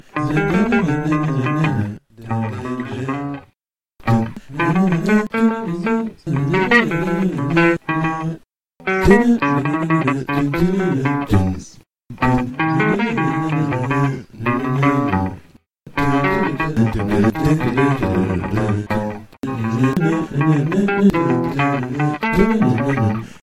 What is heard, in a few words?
gtr And NaNas